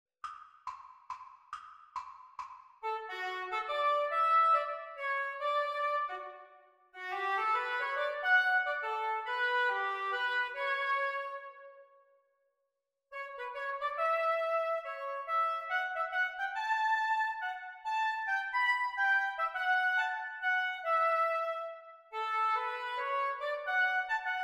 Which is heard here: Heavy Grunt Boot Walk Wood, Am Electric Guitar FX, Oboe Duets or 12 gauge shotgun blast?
Oboe Duets